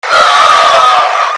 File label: fear die